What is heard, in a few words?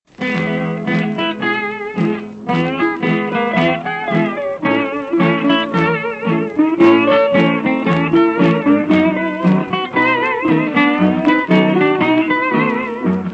Various Hawaiian Falsetto Voices